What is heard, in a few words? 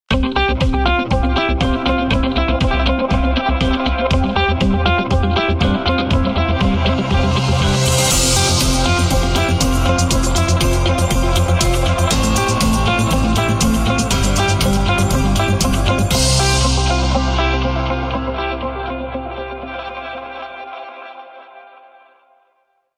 Airwaves; LG Wing 5G Verizon; ringtones; VZW